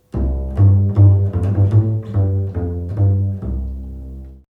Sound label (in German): Kontrabass